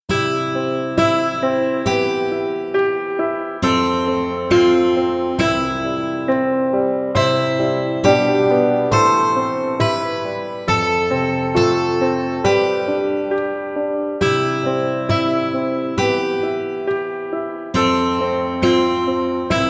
Yuugure Ni Atta Hito Piano; gekiban

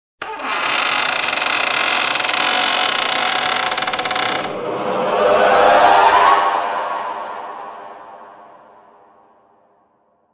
Door; gekiban

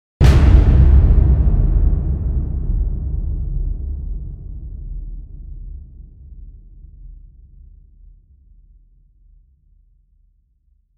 Impact; gekiban

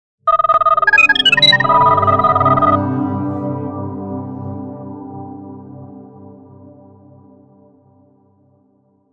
Robot; gekiban

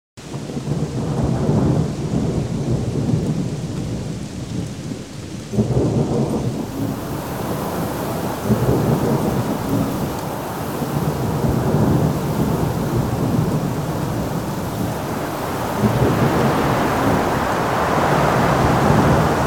Wind; gekiban

